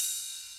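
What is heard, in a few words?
ELEC RIDE, TECHNO TRANCE; Pro-Rec - Synthsonic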